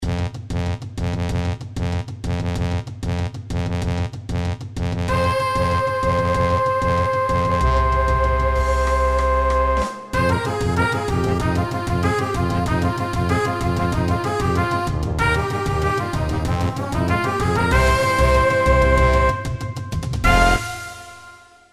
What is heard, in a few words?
Hungarian Tune